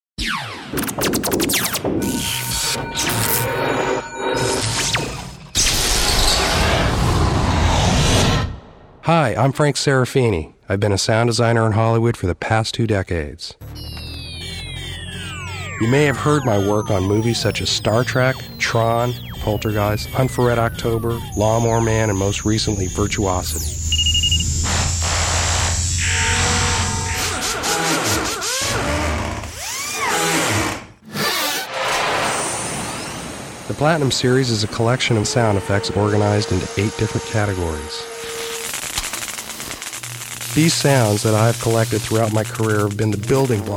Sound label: sfx; l-squared sfx demo; General Sound SFX